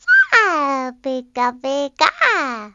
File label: cute pika idle, pets